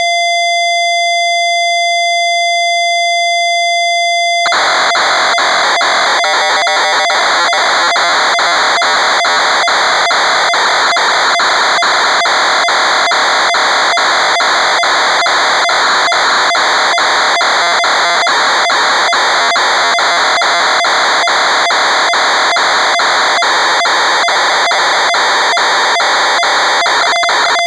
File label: FIREWORKS